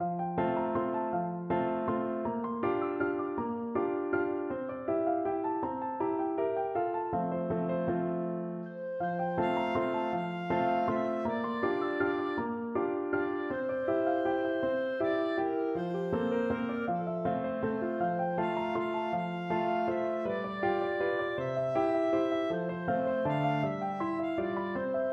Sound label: deh vieni, clarinet